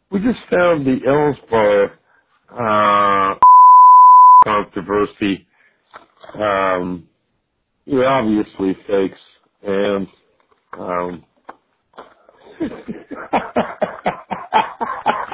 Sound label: voicemail laughing